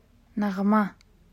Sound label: melody